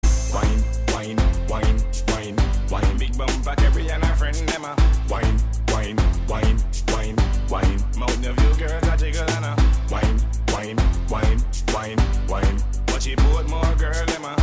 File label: Whine